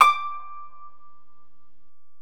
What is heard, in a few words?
KANUN D5